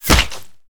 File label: bullet impact body flesh